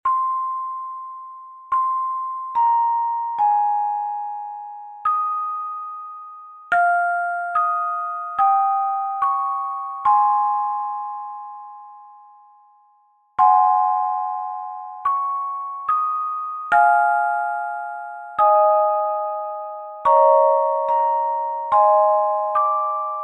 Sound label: Abide With Me 2 octaves handchimes